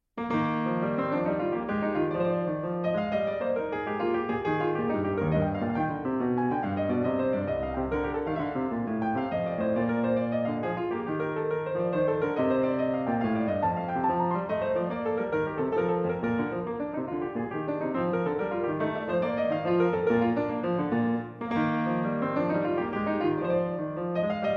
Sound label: French Suite No.4 in E Flat, Courante; BWV 815; Vladimir Ashkenazy; Bach French Suites, BWV 812-817